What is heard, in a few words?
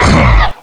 pain, strogg; Quake 4 Alpha; id software; tik